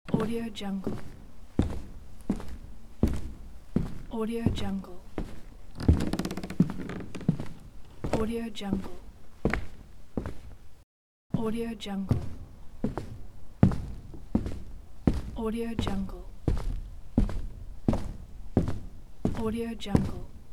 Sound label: steps on wood